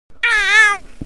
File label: Baby Cry Or Duck Quack